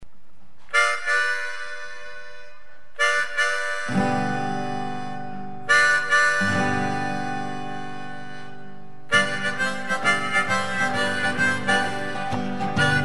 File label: shuffle, harmo